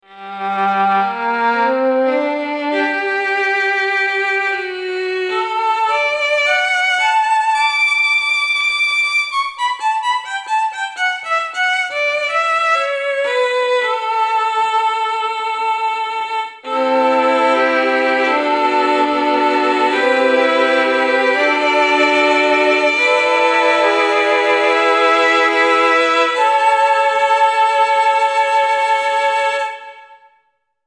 Solo Violin

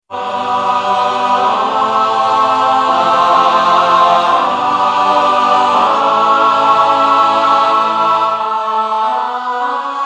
Birotron Choir

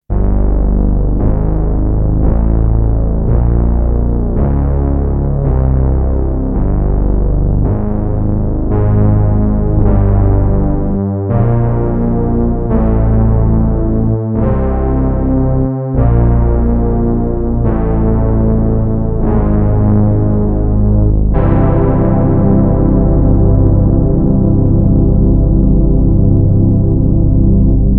moog taurus bass

